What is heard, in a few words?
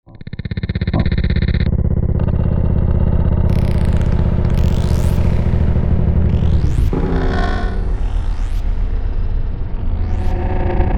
Pocket Dimension, Some ambience made using a combination of synthesis and resampling.